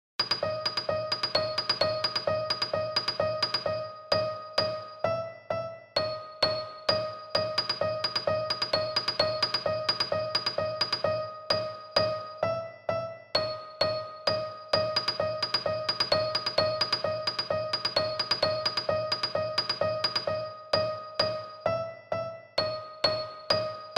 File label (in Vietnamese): nhac nen hoi hop lam giam cang thang